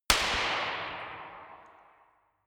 gun slapping pop gun ii

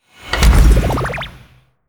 promo pad; whooshes-rises; hits-impacts

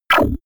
laser gun low amo; krieg-waffen; soundpool

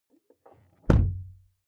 car door close interior hummer h3; Cars

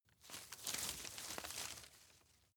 grab rustle soft; berufe; foerster

